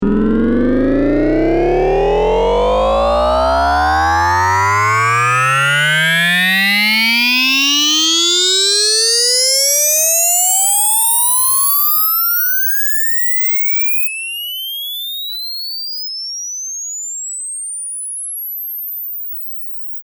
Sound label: ahh sweep 20 20k